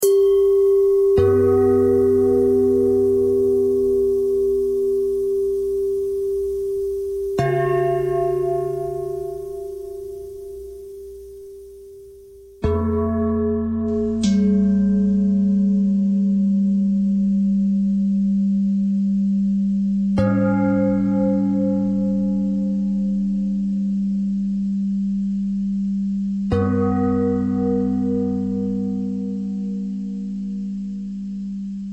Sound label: bell bell thread noise